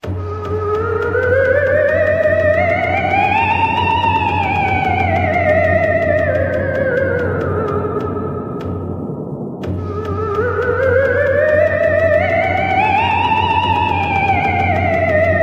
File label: Jingle Rpg Horror; Taiko no Tatsujin DS Dororon Yokai Daikessen